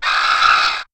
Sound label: chicken flyer